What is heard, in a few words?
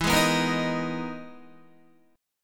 E-Major Sharp 11th E, Guitar; EM#11 strummed; 11,11,11,15,15,11; JGuitar